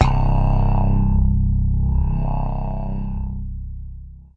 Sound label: eerie filt, Basses